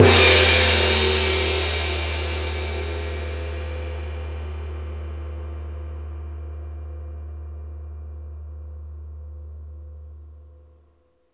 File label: Gongs; CYM; SB Gongs